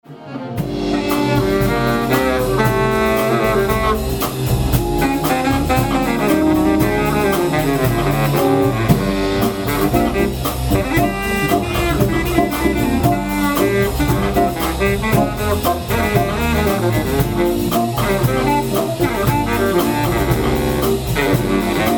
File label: sick Bari Solo